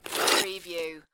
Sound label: Snow and Ice, Ice skate slide with ice particles